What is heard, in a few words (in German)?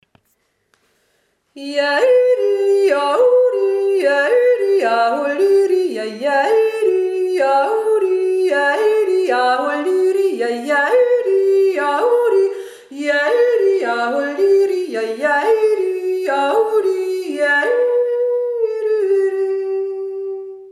jodlerkanon